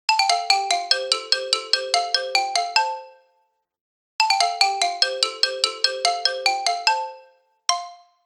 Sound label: Marimba, ringtones; OnePlus OxygenOS 13